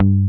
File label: GTR TRIK, Jazzistic; Univers Sons